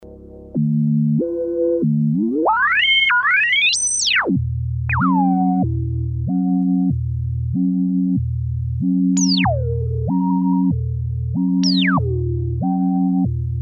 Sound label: fx filter, Kawai sx240